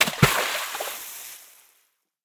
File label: Lagoon Water Splash